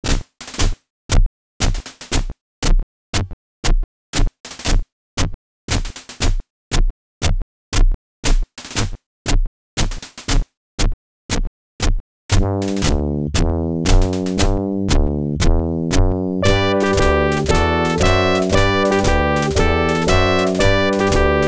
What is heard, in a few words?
pc victory march; wolfenstein 3d